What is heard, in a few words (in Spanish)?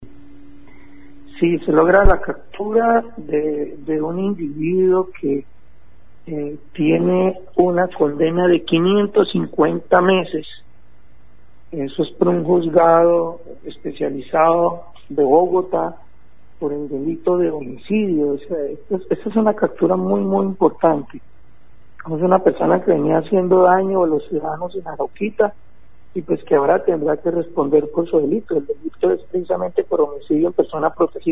coro